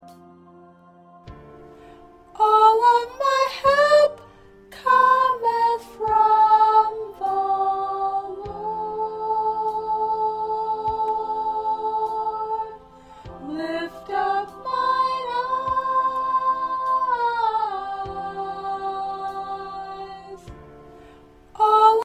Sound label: 1st Soprano, choir parts